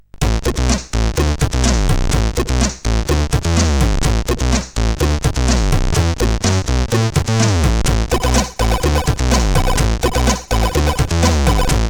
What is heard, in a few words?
tune, CSG8580R5; sid